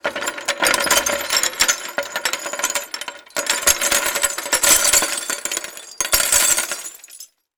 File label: Ceramic Debris Falling On Wood Boardwalk; Crashes